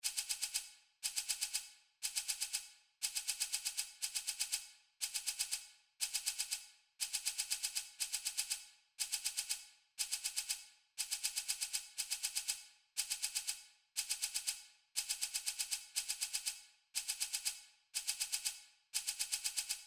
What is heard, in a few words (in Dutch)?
shaker ei